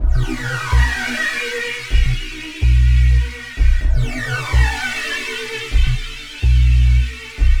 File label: 126 B COMBI GROOVE; Loopmasters; Funky Underground Vol 2; COMBI GROOVES